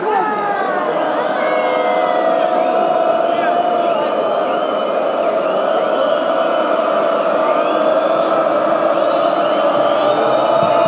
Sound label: goal kick, fanchants